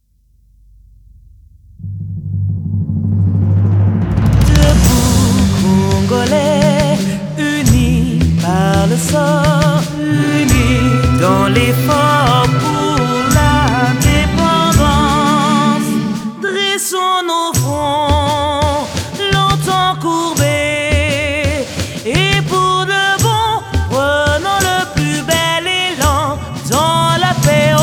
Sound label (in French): L'hymne national Chanté